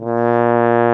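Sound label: brass, french horns; Roland - LA Composer vol. 1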